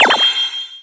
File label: results crown